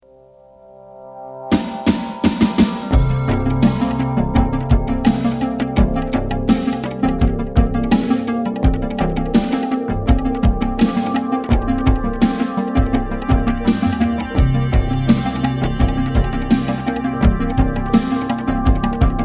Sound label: synth thing drum jazz